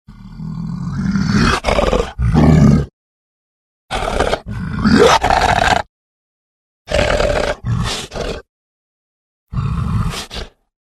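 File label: sounds of demon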